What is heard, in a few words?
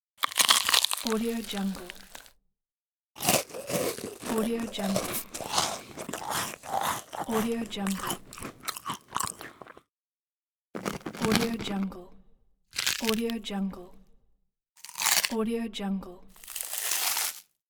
crunches